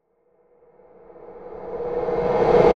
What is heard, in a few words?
Riser